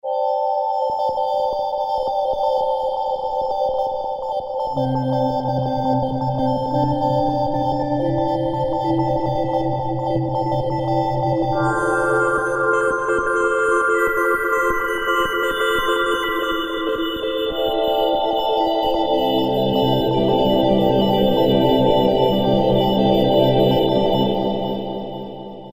Wave Cycles, Korg N364; Factory Prog demos

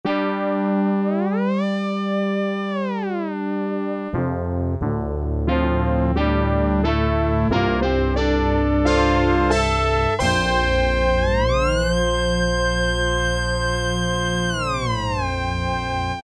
BRASS DETUNE, Casio VZ-10M; Internal factory combi

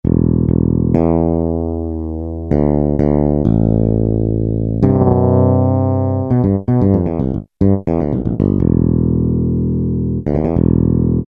BS Fretless; BS-PNA Fretless; Roland L-CDX-01 Rhythm Section Instruments

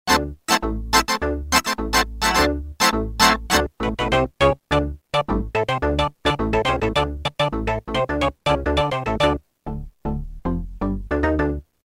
Dance Arp; Roland M-DC1

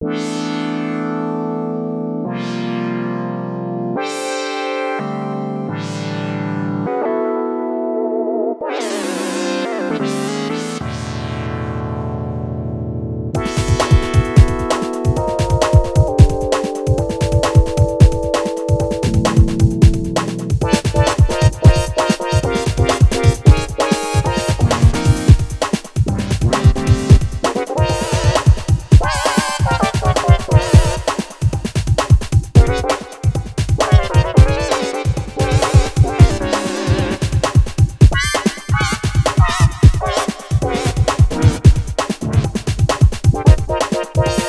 Polysix Chord, Korg Radias-R

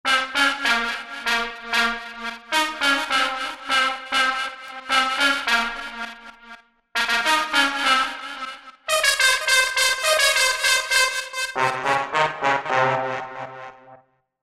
Brazzer Lo Fi

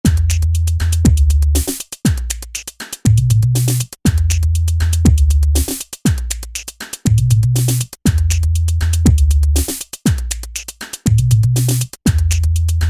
Techno

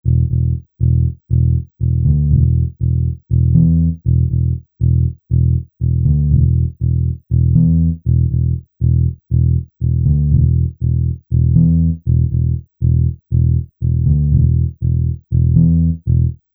Finger Bass Patte

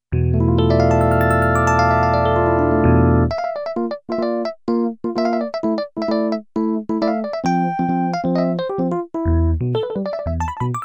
Elec Piano Drwbr Orgn; Elec Piano 1 Drwbr Orgn 1 demo song

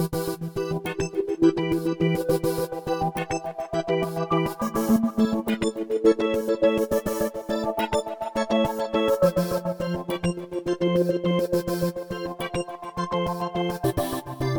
Vox Wave Seq, Korg Radias-R

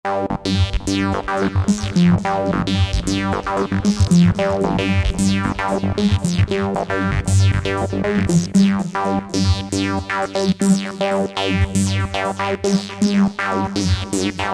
Roland JV-2080

